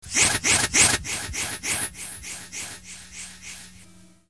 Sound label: Slash; Halloween; Hallmark Halloween 1989